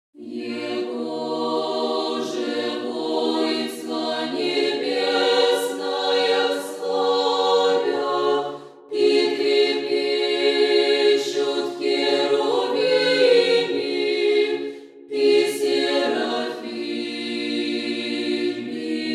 egozhe voinstva nebesnaya slavyat irmos 8-oy pesni velikogo pokayannogo kanona